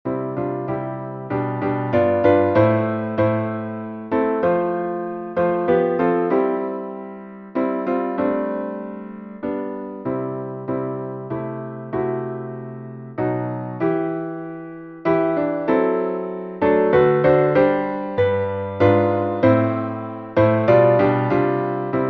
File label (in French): il approche le jour de grace piano